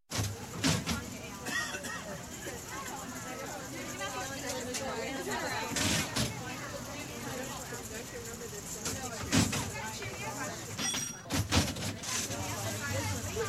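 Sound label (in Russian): звук вокзала и проходящий мимо поезд; zvuki vokzala; sueta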